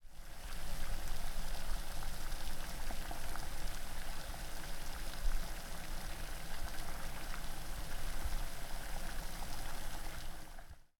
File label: Hot Tub